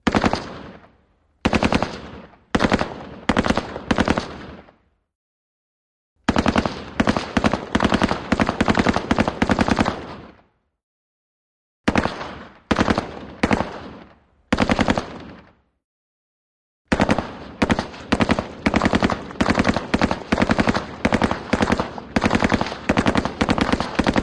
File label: M60 Sporadic Firing M